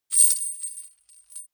object metal chain jerk

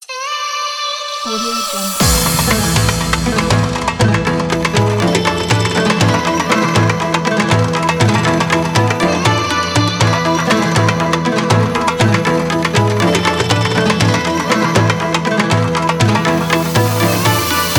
arabic sunrise intro

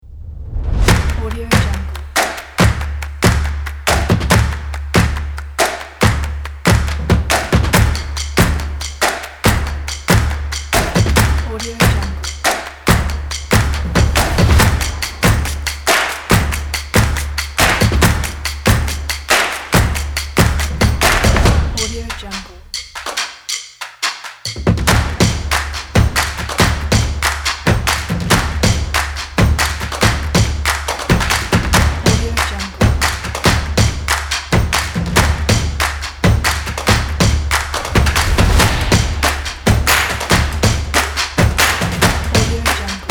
percussive

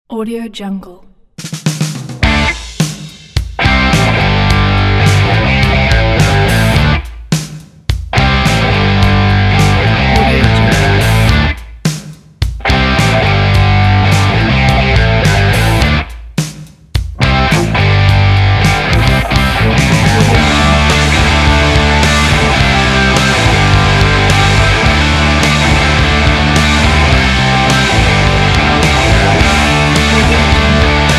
classic rock

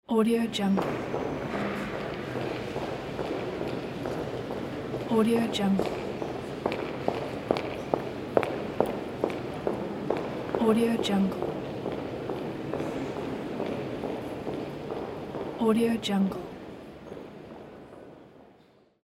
women heels; 3dhome

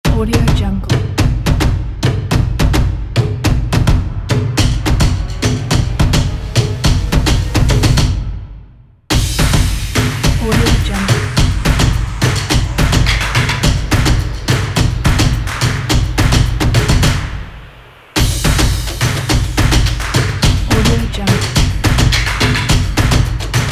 sport drums

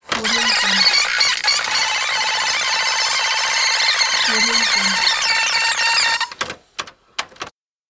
rewinding cassette in deck during playback

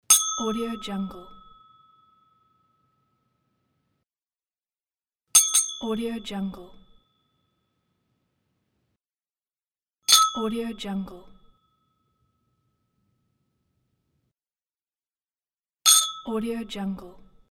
clinking glasses

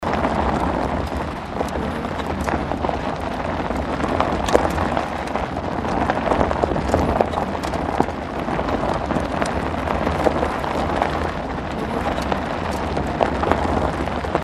movement car on gravel loop

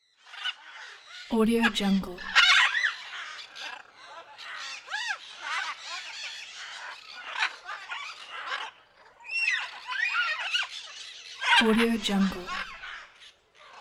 tropical birds

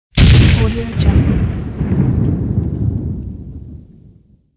explosion echo

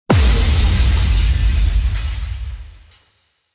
explosion with glass

